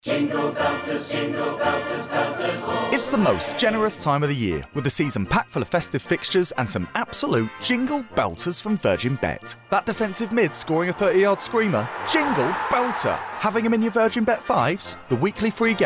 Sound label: JINGLE BELL MUSIC